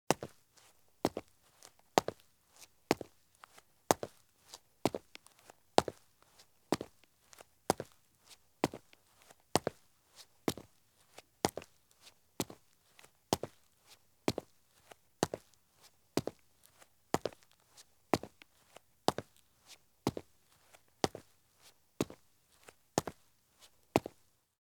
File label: Heavy Running Shoes Walk at Medium Pace on Concrete; Sound Ideas; Footstep Sound Effects